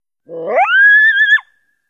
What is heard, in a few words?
Coyote Howl. Single Vibrato Howl . Medium Perspective.